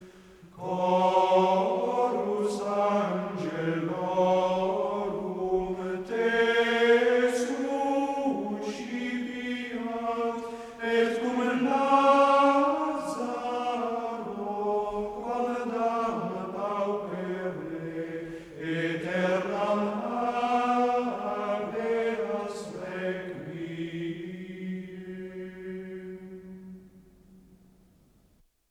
Antifoon ( Gregoriaans ); Kyriale; in paradisum

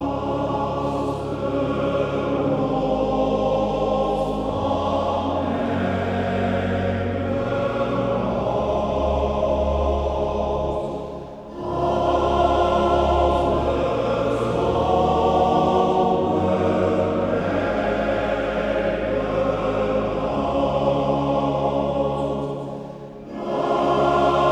Koor (mannen); Emmeloords Chr

